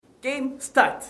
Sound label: Game Start